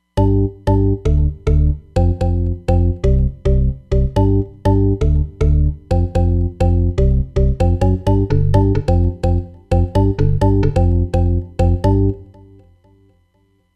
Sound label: House Bass, Roland Fantom XR